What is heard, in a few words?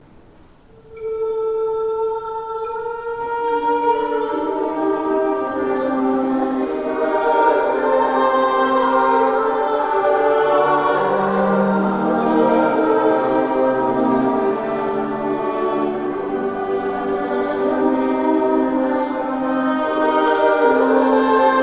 with organ; Lassus; Je l'ayme bien